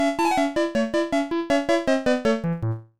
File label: Jingle classic frog game over; Frogger Helmet Chaos; nintendo ds